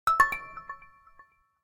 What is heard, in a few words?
Receive